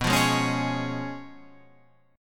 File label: Db 7th Cb, guitar; Db7/Cb strummed; JGuitar